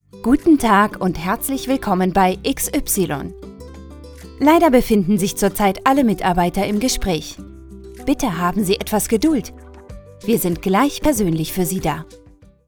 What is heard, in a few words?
Wait Loop